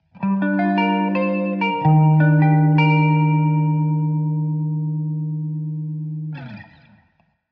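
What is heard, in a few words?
Electric Guitar Melancholy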